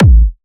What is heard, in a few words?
Techno, Kick; Techno Sounds 2024